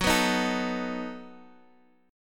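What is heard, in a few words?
B 7th G flat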